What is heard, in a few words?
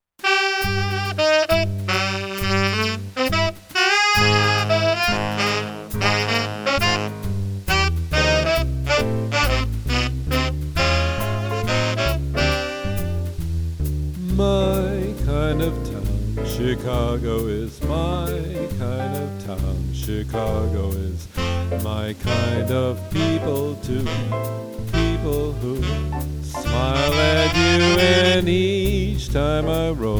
piano, sax & vocal